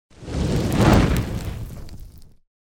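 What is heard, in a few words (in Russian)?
короткое горение; Звук огня; Звук огня для монтажа перехода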